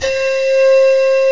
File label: blower